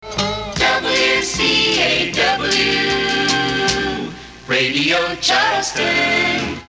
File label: jingle Radio; 1979 jingles